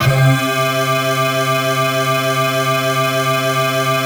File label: synth clav